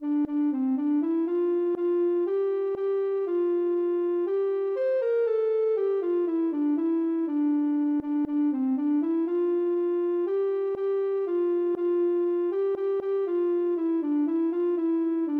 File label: ocarina